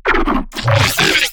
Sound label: glitch stab; Virtual Riot; Glitch Stabs